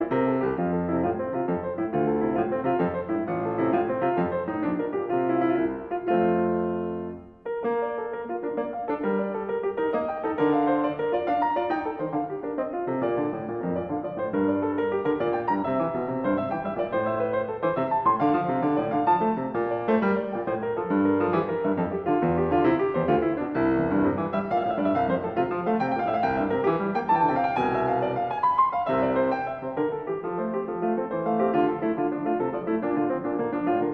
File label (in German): partita 1 b dur bwv 825 corrente